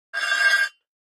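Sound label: Metal Scrape On Cement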